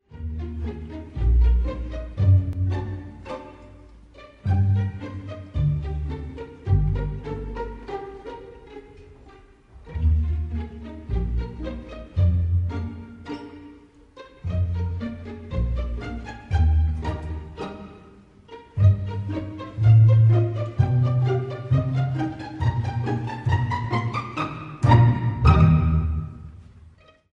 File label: pizz, string quartet; delib